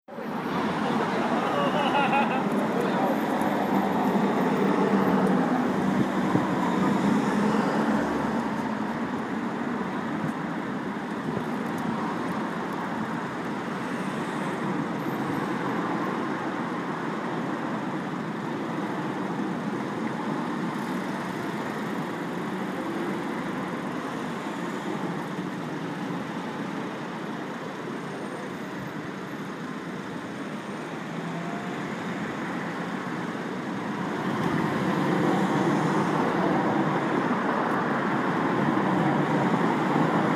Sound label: traffic city